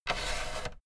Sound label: fader plastic scrape double up slow